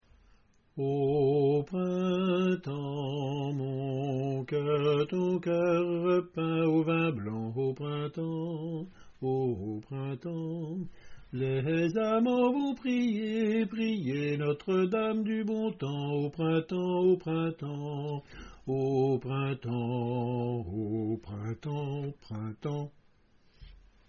coda hommes; au printemps

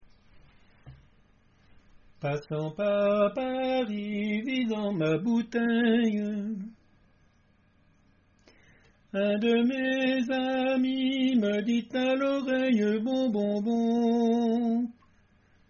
passant par paris tenor

